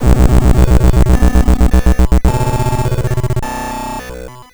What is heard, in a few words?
dark glitch